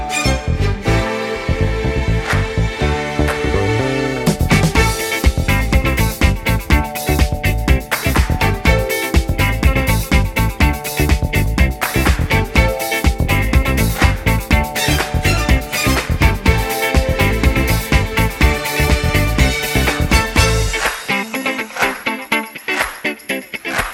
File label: Instrumental